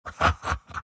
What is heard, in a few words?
troll laugh; dota; troll warlord